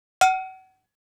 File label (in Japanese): 缶カン